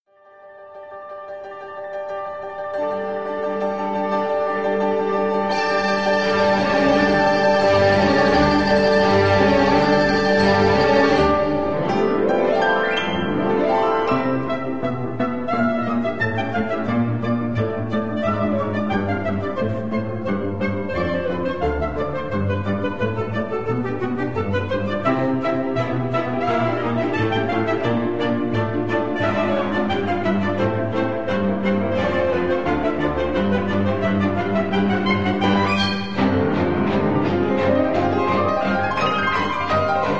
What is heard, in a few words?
Finale Music; carnival of the animals